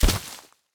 Land Grass; Opsive; UltimateCharacterController

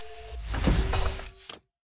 Door Close; Opsive; UltimateCharacterController

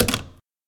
Door Close; RPG Creation Kit; RPG Sounds Pack 6; qubodup

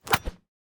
In Air Slash, Melee; Opsive; UltimateCharacterController

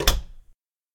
Door Close

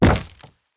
Land Wood; Opsive; UltimateCharacterController

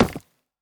Footstep Wood; Opsive; UltimateCharacterController; Footsteps

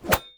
Melee Slash; Opsive; UltimateCharacterController

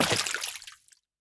Footstep Water; Opsive; UltimateCharacterController

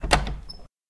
Door Open; RPG Creation Kit; RPG Sounds Pack 6; qubodup

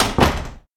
Door Close; RPG Creation Kit; RPG Sounds Pack 6; qubodup

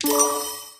Interact Chest; Opsive; UltimateCharacterController

